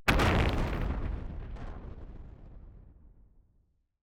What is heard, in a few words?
new explosion; ludumdare32